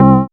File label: Synths C; Vengeance Samples